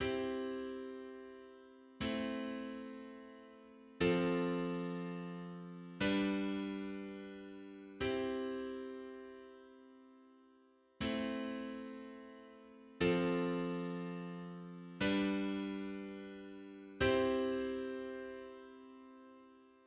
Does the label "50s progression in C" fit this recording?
Yes